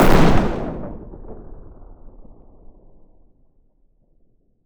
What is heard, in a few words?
Explosion Small; meteorgame